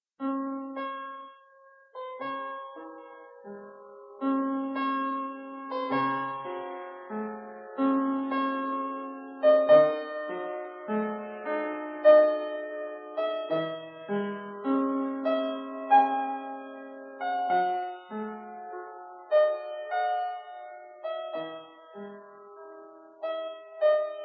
sonata rubato

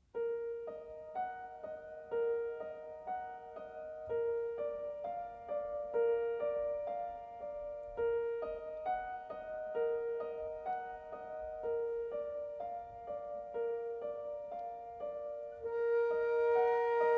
pieces for flute and piano

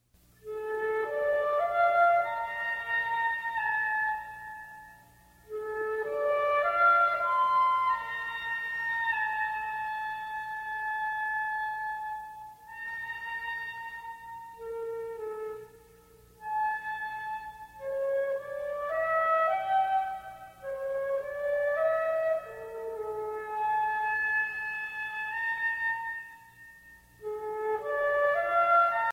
solo for flute

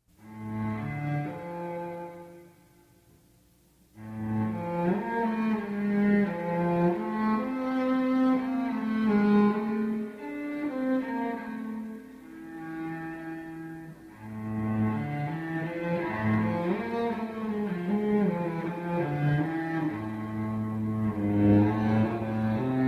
solo for cello

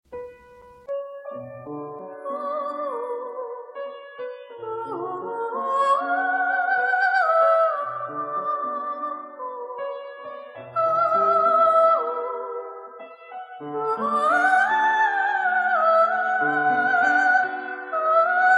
piece for piano and vocalise

